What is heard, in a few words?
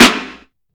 dirty steel snare drum b key; snare drum samples